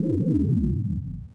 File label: conduit Force Field end; doors elevators; Star Wars Obi-Wan; XBOX